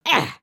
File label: Run; creatures organic; Lego; Strombie